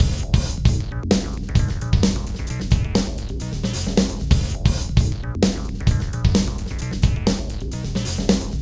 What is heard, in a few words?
ROCK DRUMMER